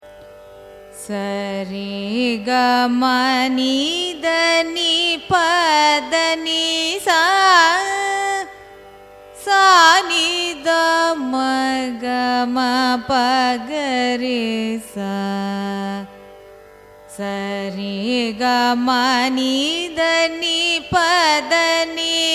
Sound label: raga nattai kurinji arohanam avarohanam, carnatic music